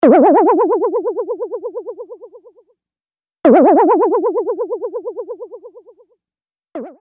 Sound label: Comical Boing